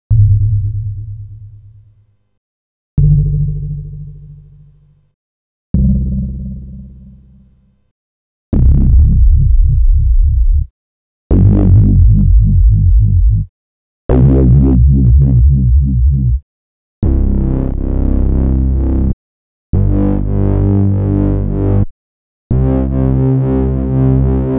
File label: breaks, Jungle; Zero-G Jungle Frenzy 2